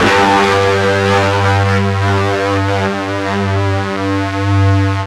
FUZZ G 1, Guitar; East West; Steve Stevens Guitar Samples Collection

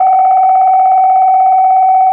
Tape Delay Feedback; radiosonics

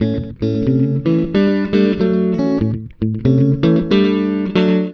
BLUES, Acoustic Guitar Loops

